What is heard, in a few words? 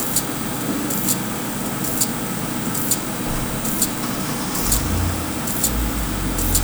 gpg many keys